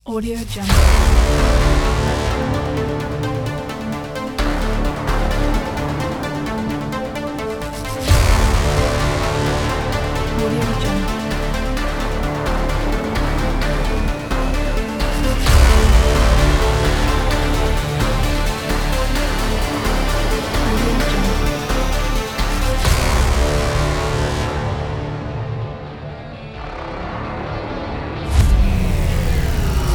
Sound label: cinematic synthwave; theo-sound